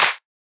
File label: Clap, Techno; Techno Sounds 2024